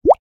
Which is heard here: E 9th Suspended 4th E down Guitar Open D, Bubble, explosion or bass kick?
Bubble